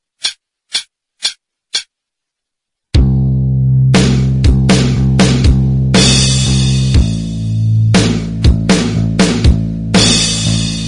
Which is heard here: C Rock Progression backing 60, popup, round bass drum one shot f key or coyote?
C Rock Progression backing 60